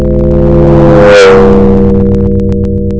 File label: ideal data at sensor1 speed40kmh